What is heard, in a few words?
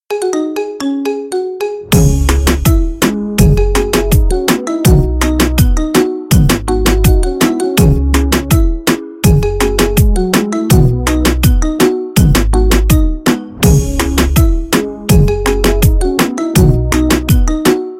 marimba remix